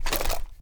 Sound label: ar reload fast twist, weapons; viper